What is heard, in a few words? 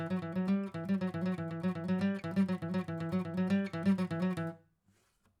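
acoustic pattern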